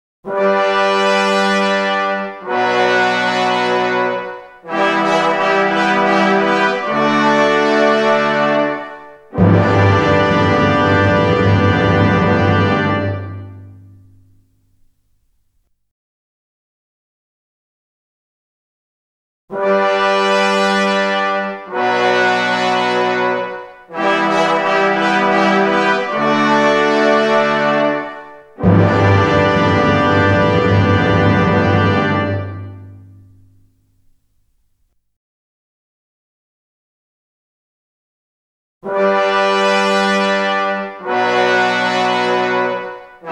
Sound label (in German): fanfare